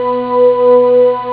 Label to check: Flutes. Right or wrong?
Right